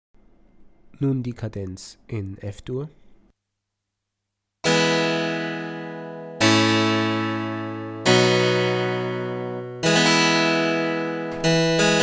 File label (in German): kadenz; Bach